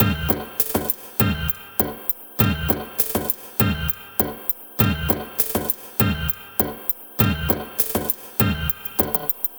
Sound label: Maqsum Electro; Sonic Foundry (Sony Creative Software); Myths of Technology; Composite Beats